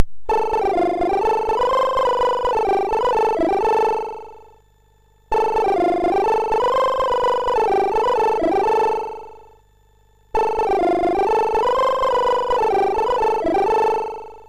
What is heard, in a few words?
MOS6581R3, sid; Omidar